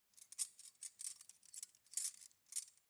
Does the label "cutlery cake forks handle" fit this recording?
Yes